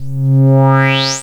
init hud; orni attack

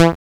menu accept; orni-attack

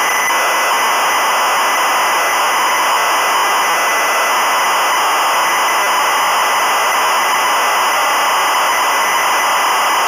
loading data; jdd opendingux; LoadingScreen: nous efectes de so

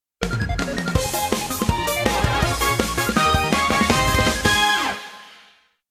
congratulations, music; nou: musica al completar el joc; coffee crisis arcade edition

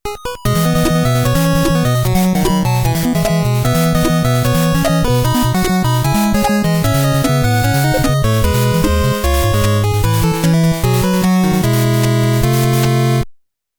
music menu

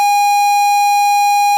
loading sound; jdd opendingux

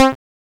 menu select; orni-attack

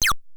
death; jdd opendingux

